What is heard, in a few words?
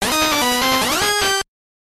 Death; Solomons Key